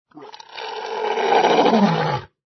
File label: ANIMAL LION